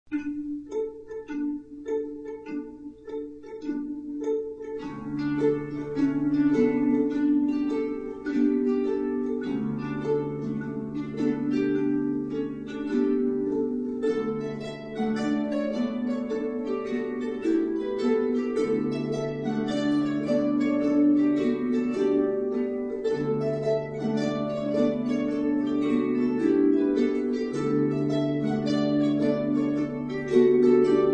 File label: gavotte, harps